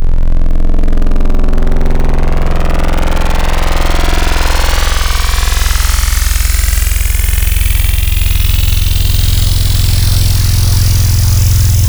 Air Lazer; Digital; Synthetic's Serum Collection; Echo Sound Works Core Tables

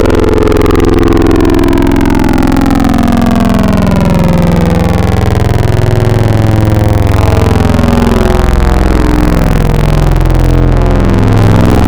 Robotic Orgasms; Wavetables; Synthetic's Serum Collection; Cymatics - Wavetables Collection Vol 2

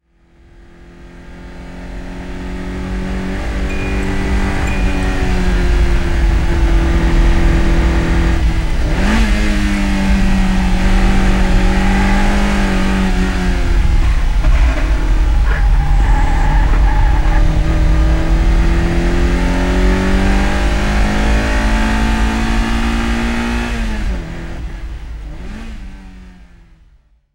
Boost Sound

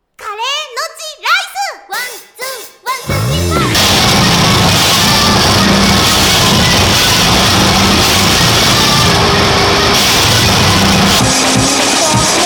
Noise

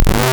Saw Phonology; Synthetic's Serum Collection; Cymatics - Morph Wavetables Vol 2

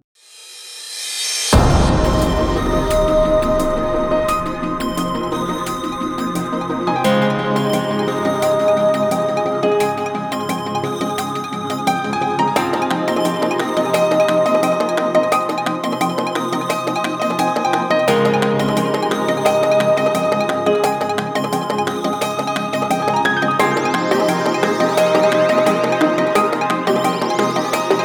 Instrumental; lolicore; Comiket

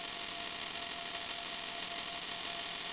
Atmos; Synthetic's Serum Collection

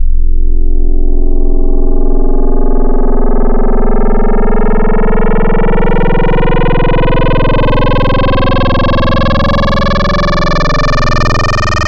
Fm, Wavetables; Synthetic's Serum Collection; Cymatics - Nuclear for Xfer Serum Wavetables; Morheeba

